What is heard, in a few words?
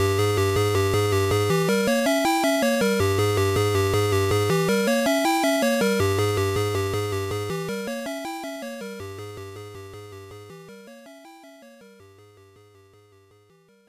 Enemy Transformation; Super Mario Land; Gameboy rip